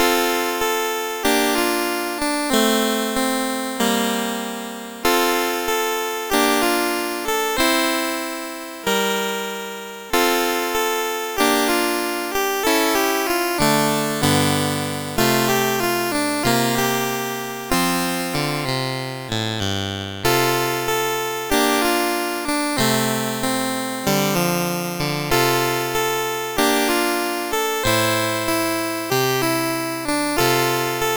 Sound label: Gospel Song, MOS6581R4